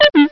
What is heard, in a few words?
Beep Boop; Cheesy Lo-Fi Sound Effects